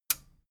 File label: metal button radio push enable